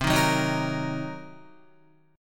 C Minor 7th Flat 5th Guitar, chord; Cm7b5 strummed; JGuitar

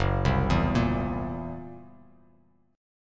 Fsharp 7th Flat 5th down Bass Standard; arpeggiated; JGuitar

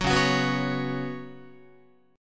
Db 7th Suspended 4th Db down Guitar; strummed

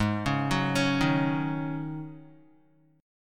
Ab 7th Suspended 4th Ab Guitar 4F,4A,5C,5G,5C,6E; arpeggiated; guitar chord; JGuitar

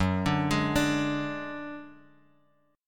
F sharp Suspended 2nd Sharp 5th, Guitar, Open F; arpeggiated; JGuitar

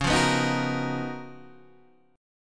C sharp 13th C sharp, Guitar, DADGAD

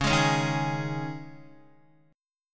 Db Suspended 2nd Db Guitar, Dbsus2 chord; strummed; JGuitar

